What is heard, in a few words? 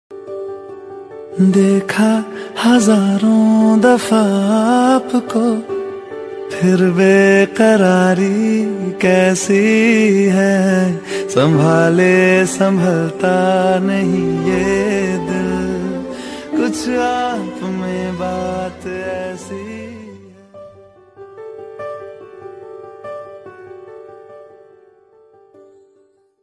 bollywood ringtones